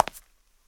marble step; Golden Crayon Sounds

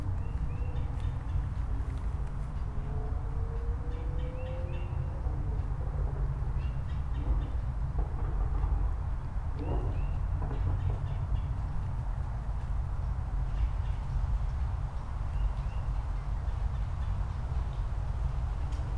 city backyard; Golden Crayon Sounds

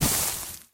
brush step; Golden Crayon Sounds

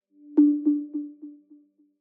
Model Processing, iOS Sounds

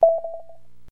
Received Message; Business skieme Beta 1

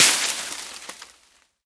wall foliage; Golden Crayon Sounds

